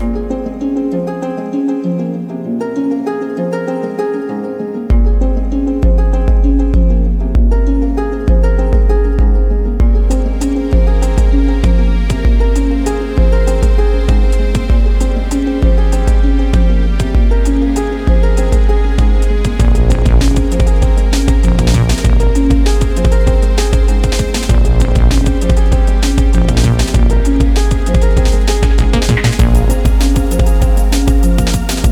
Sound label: ambient